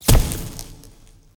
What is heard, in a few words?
Fx Lantern Miss; Levels Hub Mansion; Twitch Escape